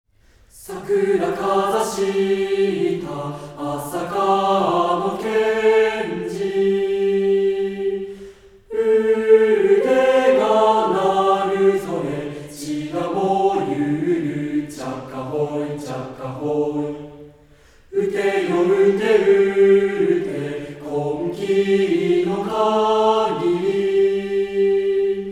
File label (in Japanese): 混声合唱; 紫の旗ゆくところ